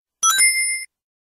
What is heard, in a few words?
ring sound effect in stereo; sonic